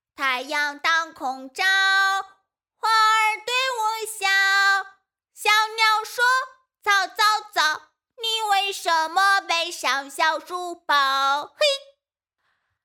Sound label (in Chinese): 男童上学歌